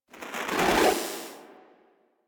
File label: Syndicates Trans In, UI; DE; Warframe